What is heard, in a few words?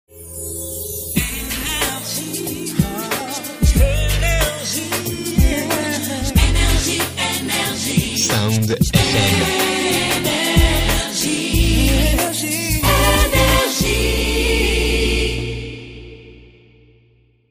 jingle; nrj